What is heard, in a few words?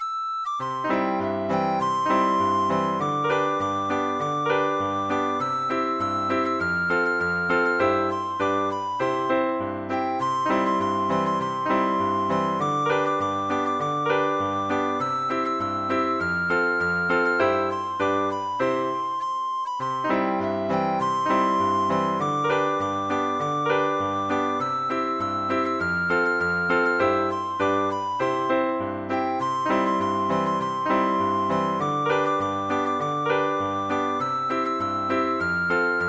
grand, recorder